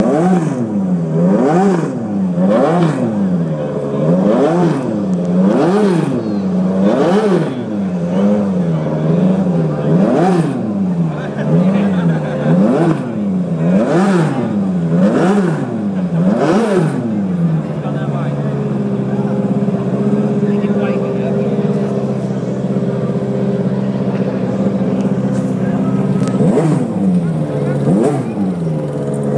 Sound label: motorbike noise test